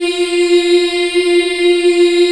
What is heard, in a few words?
F3 CHILD, Choir; CHILD IHF